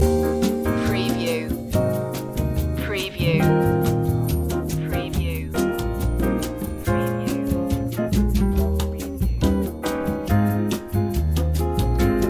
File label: good morning bossa loop